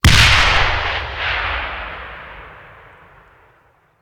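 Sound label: Artillery Grenade Launcher; Sound Ideas; Guns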